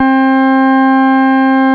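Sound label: CX3 overdrive C3, ORGAN; Sonic Reality; Vintage Timetraveler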